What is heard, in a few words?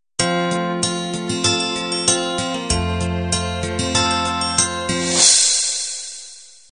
diva intro